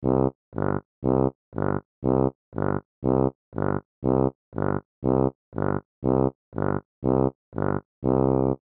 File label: March Low Steady; Musical; Cartoon